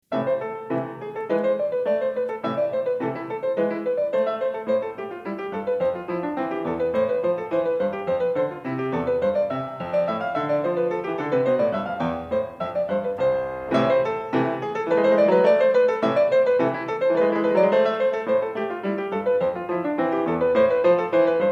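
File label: Scherzo, piano; Partita 3 in a; BWV827; BACH; PARTITAS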